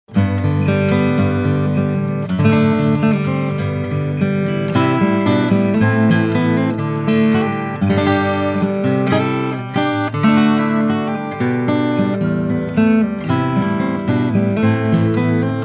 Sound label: Guitar Sample